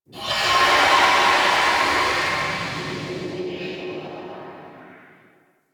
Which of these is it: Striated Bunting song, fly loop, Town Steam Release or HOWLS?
Town Steam Release